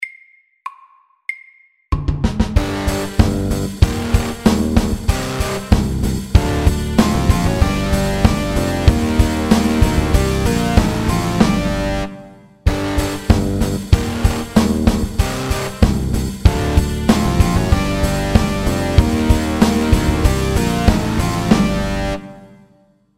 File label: Minirock FAST 95